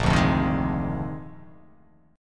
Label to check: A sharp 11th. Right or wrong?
Right